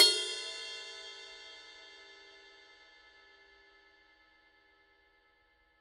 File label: heavy ride bell; paiste 2002